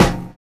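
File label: BROKEN SNARE; Univers Sons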